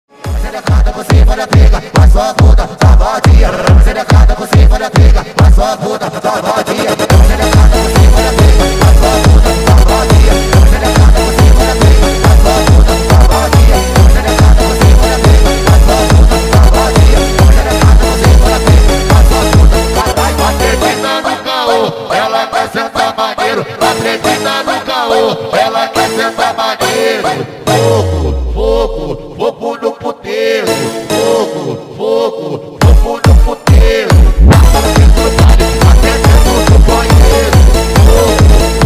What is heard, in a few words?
Phonk